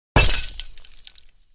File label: snowball; yeeps